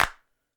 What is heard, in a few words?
Hand Clap; hydrogen drum samples